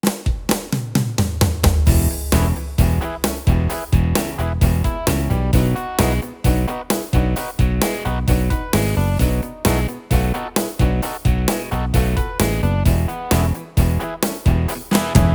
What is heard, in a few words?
style pop and rock southern rock, Yamaha tyros3